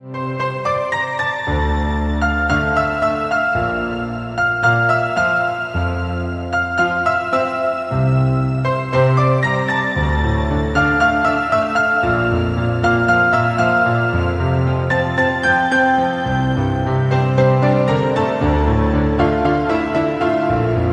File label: marimba